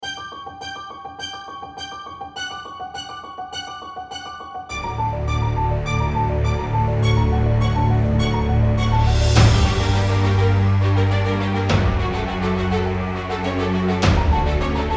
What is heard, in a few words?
ominous horror music background